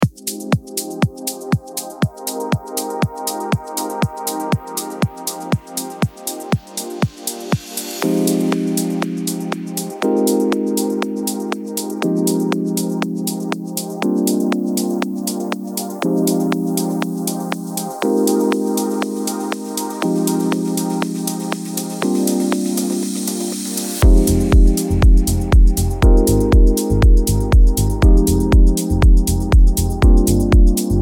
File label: launchpad in f minor ii